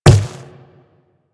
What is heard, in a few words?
Quadruped Medium Walk Dirt, footsteps; Warframe; Infested